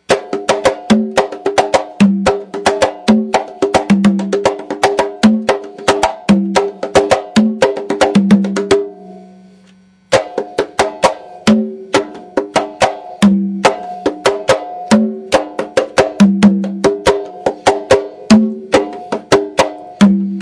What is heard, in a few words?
pop conga